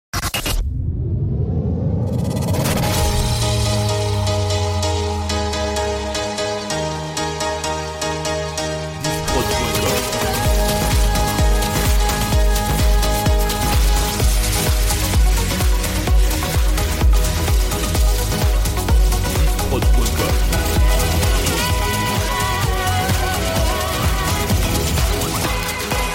Medley Electro 2010 IDM

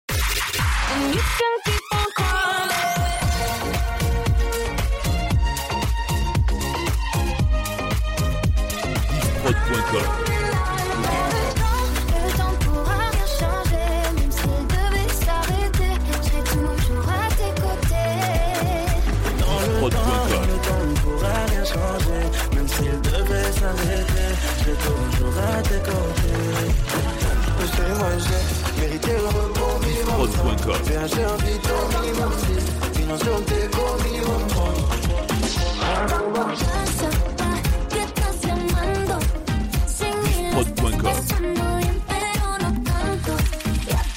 Medley Hits 115 BPM; Diffprod

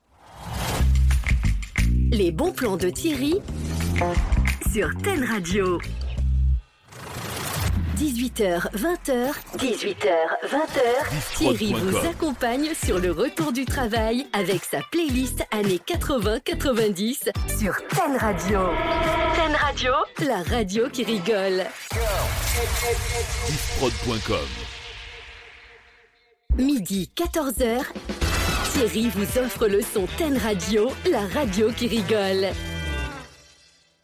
Radio Jingles Emissions; Ten Radio

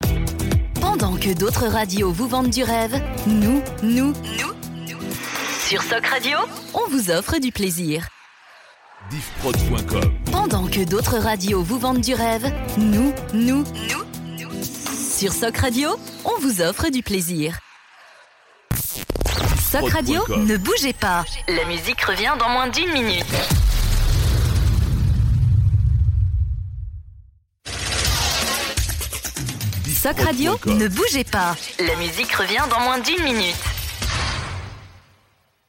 Radio Jingles

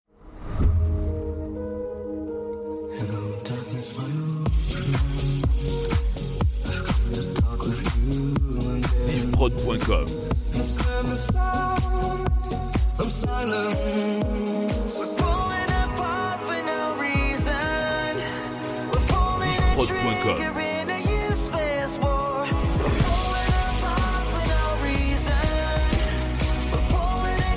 Medley Hits 123 BPM

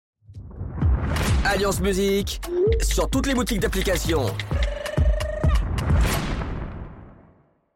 Sweep Appli; Alliance Musik

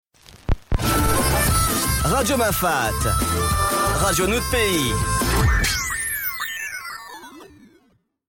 Radio Jingle; Radio Mafatt; Noutt Pays

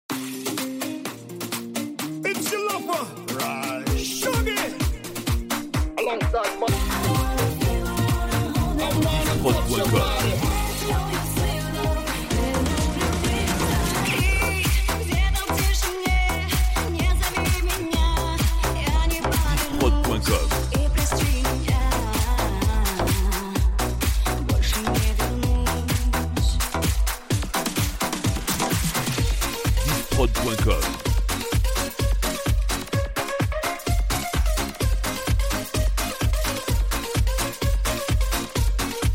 Medley Dance 2010s

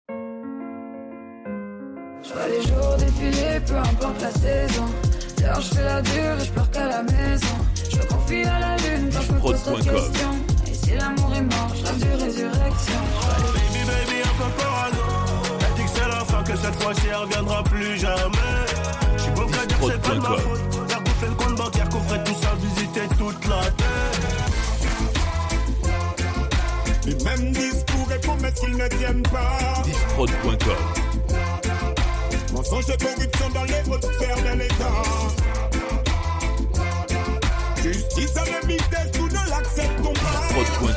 Medley Urban

